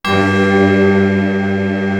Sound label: 01W CHURCH; East West; Global Sounds